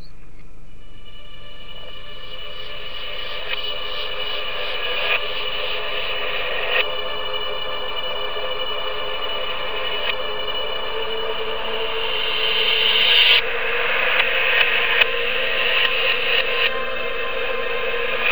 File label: radiation music; Half-Life 2